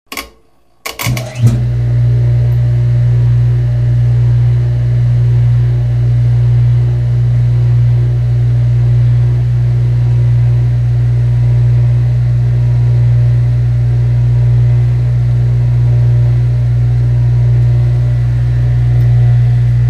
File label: Dishwasher Turn On